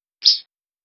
create a pickup sound of, farming

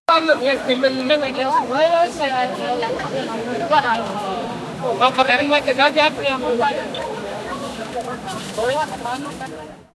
town market

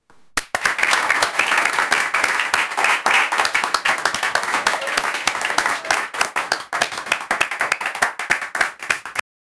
clapping, audience

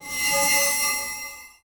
movement

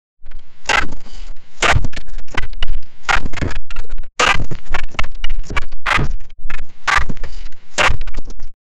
anime style the footstep

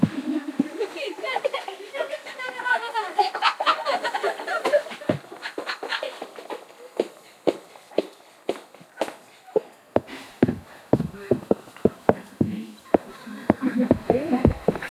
cheerful and energetic

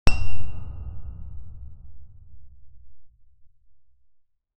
low frequency confirmation

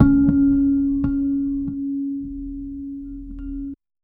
set speed slow pitch mid, instruments

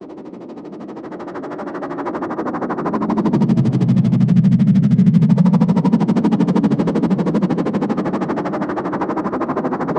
alien ship rotating sound

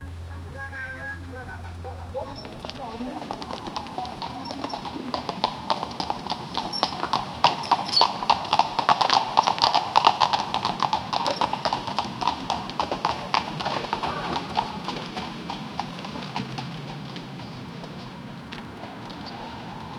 sound of a 19th century, horse hooves

